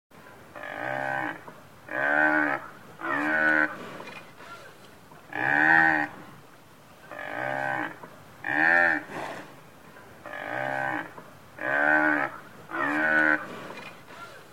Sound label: buffalo; zvuky zvirat